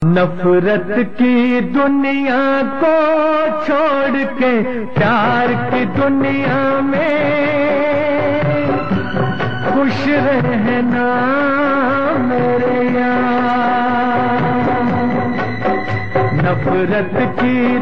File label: sad ringtone